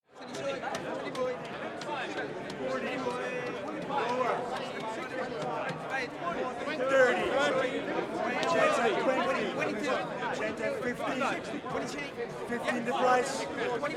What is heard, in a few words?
Dutch Stock Exchange